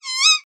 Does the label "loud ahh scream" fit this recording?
No